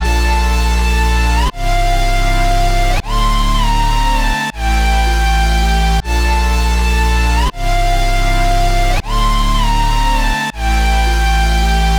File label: 160 BPM D Min, Hyperpop; Rage Pt 2